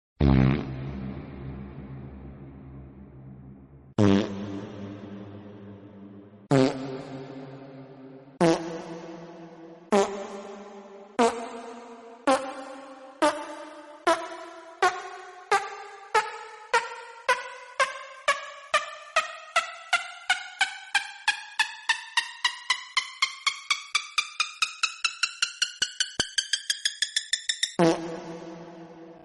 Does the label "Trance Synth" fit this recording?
No